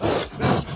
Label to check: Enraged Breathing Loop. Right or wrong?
Right